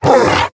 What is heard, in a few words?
Whistlers VO Hurt; We Are the Dwarves